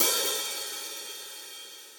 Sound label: Hihat Open Session Dry; Drum Hits; Standard Hits; Ableton; Factory Packs; Session Drums Studio